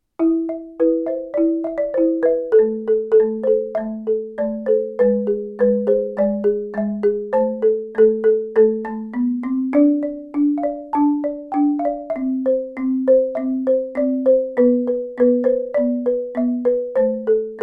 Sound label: marimba; tetris